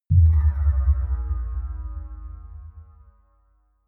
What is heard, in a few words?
sci fi bell bass